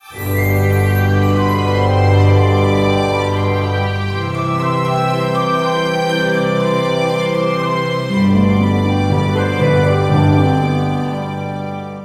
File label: Jingle Happy